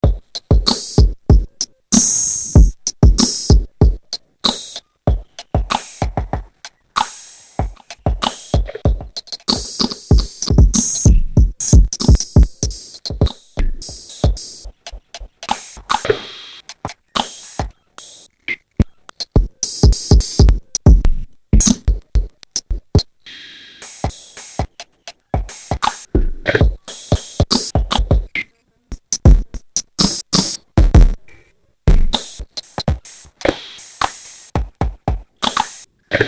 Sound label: Granulator Beat; Deformer